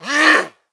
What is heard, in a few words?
spotted, zombie; DayZ Epoch